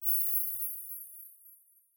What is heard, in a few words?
Magic Chimes, Fantasy Interface Sounds